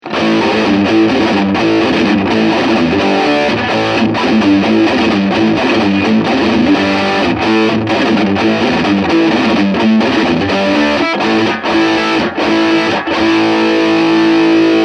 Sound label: Overdrive